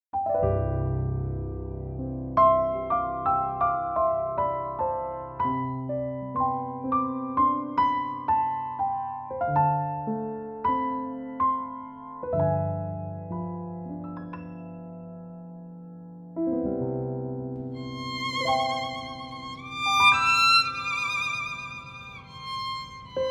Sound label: C piano play referencia